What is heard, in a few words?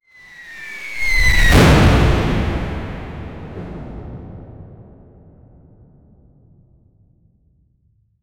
a fireball spell sound